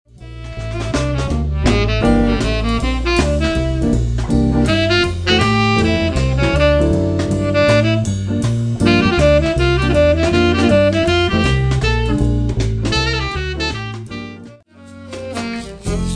Instrumental Jazz

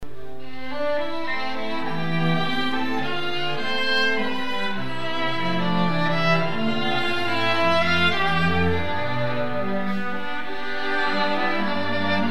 String Quartet First Violin; classical violin; Elgar

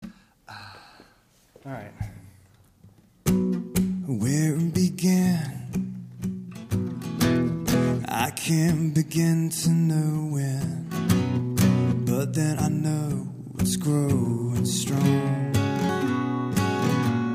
Rock

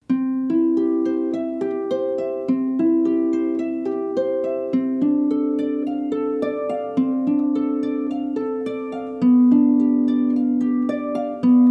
Harp; Classical

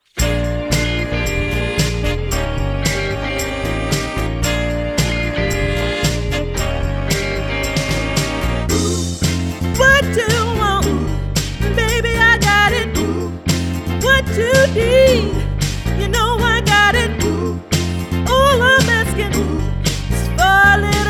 Dance Band; 9-Piece; jazz-band

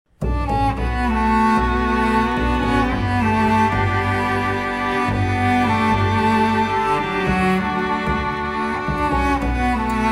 String Quartet

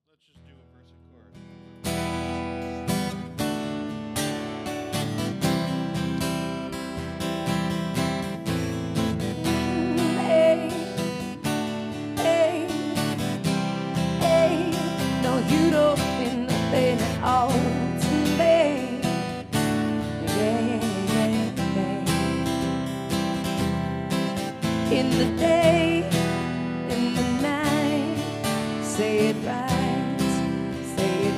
Pop duo, sax guitar

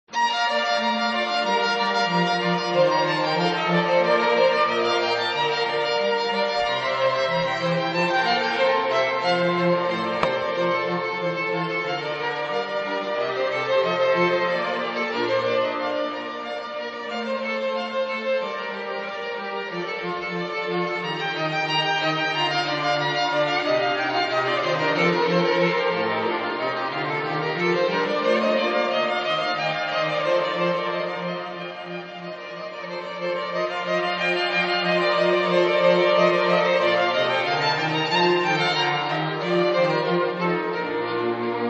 classical string quartet

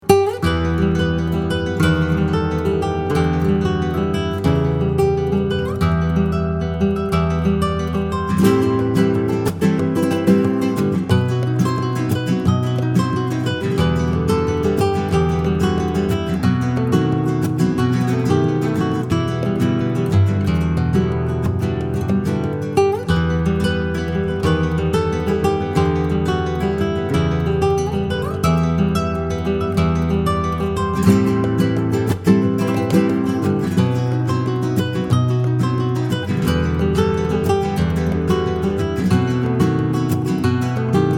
Romanza Rumba Version, Spanish Guitar Duo

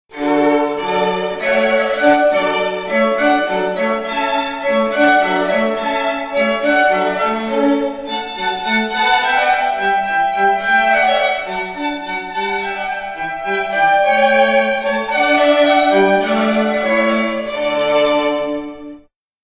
Hornpipe, classical-string-quartet; Water Music Handel

